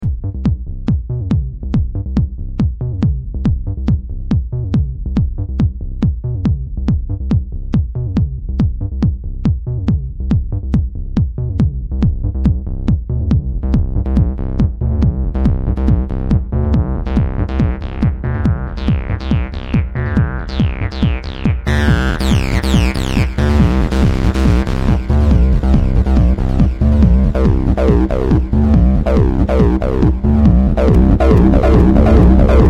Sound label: noise inc awful noise